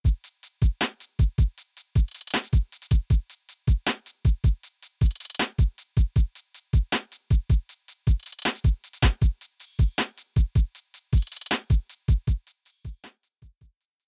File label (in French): beat sans effet